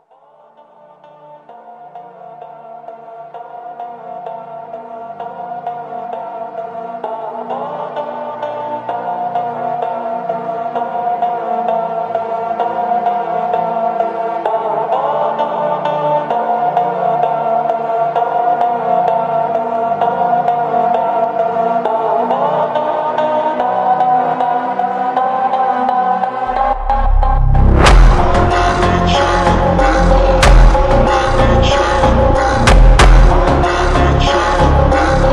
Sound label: funk extended slowed reverb